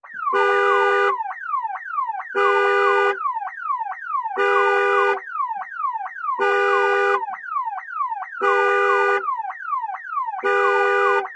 Car Alarm Series, Car Alarm with Horn 1 - On, Running, Off with Deactivation Chirps.